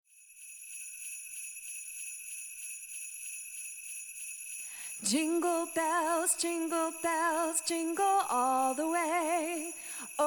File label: Jingle Bells